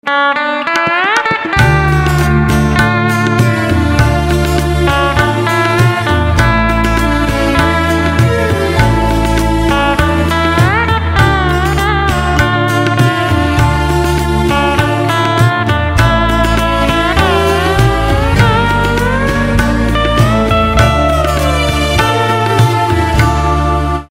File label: Veena Instrumental Ringtone